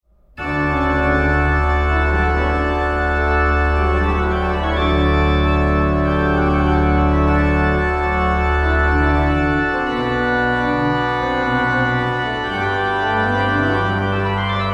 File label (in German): Chororgel, Toccata Decima